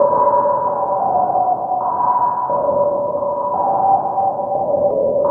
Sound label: Hyperspace Melodic, Washes; Sonic Foundry (Sony Creative Software); Myths of Technology